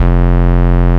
Root F, Bass

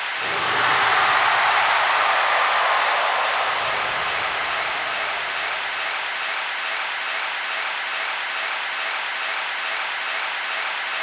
FX Vocal Crowd Mix, 140 BPM; Vengeance Samples; VTDS2 Song Kits; FX Vocal Candy Guy

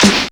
snares; Vengeance Samples

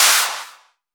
Claps; Vengeance essential club sounds-3

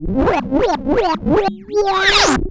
Roland C Noise, Roland S100

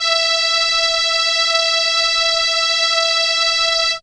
Trumpet E5, ARP Solina; musicradar-synth-samples

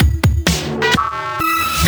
VFX2 Loop 128 BPM

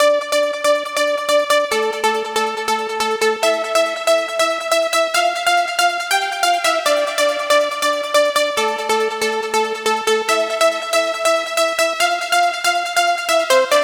140BPM Sequence Intro, Dminor; Unlooped; Vengeance Samples

